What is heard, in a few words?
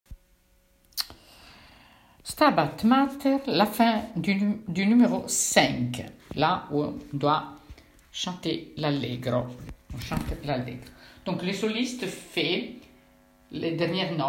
soprano; Stabat Mater 5